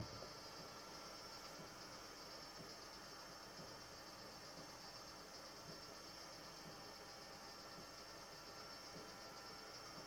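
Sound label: silence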